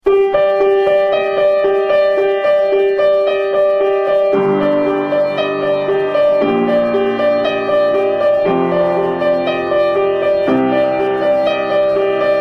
Piano

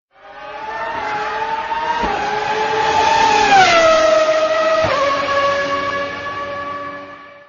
Formula 1

